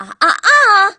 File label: ah ah ah; yukari